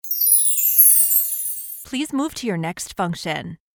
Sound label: magic chimes please move to your next function